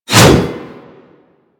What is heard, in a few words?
Axe Heavy Swing; Warframe; Weapons